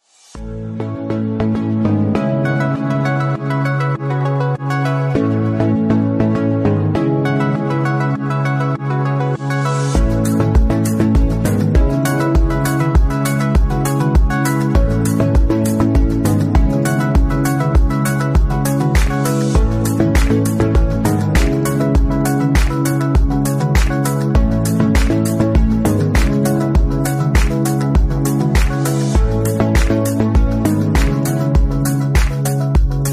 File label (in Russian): hard rok i soft rok; amerikanskaya muzykalnaya